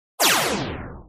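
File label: Laser Gun Blast Descending Sweep; laser fx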